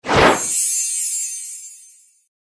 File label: Cloak Of Magic Spell Craft, magic sound effect; fantasy; role playing games; Andrew Fleming